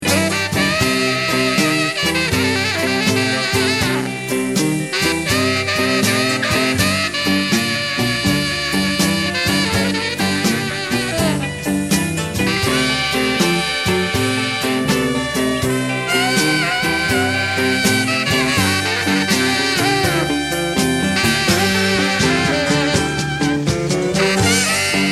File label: Lonesome Saxophone